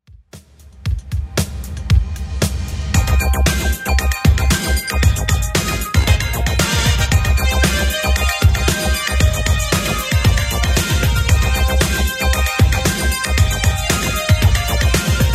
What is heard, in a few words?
80s Redrum 115bpm